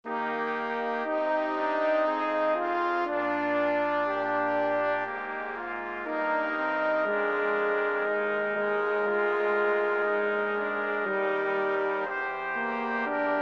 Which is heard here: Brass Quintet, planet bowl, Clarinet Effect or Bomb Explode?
Brass Quintet